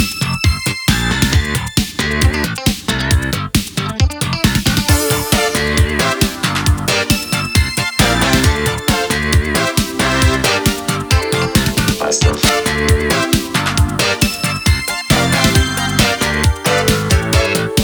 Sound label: Oth Shop Loop; Galaxy of Pen & Paper